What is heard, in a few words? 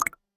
Balloon Pop